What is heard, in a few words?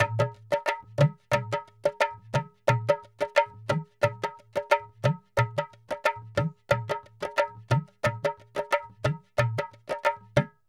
Dholki Loops